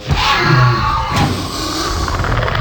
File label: raptor idle, raptor